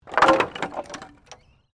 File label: Wood planks debris; Materials